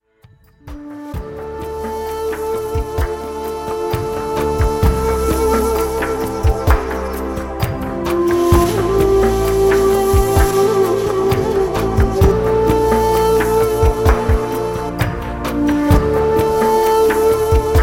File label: flowing bamboo